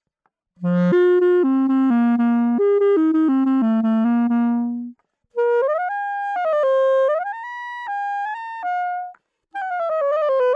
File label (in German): Klarinette Sounddemo